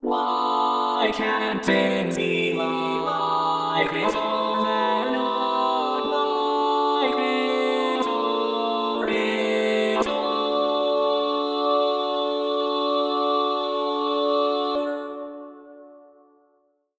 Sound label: All Parts, Barbershop Tags